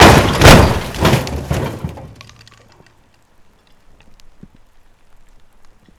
mercedes benz dropped 1m on concrete, Car Debris, Impacts & Crashes; Pole Position